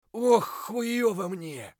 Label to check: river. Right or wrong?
Wrong